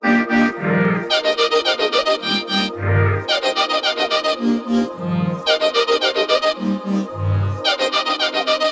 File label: Drop Loop BPM 110 D Maj; Gully Loops; Morom; UDD Gaye Sample Pack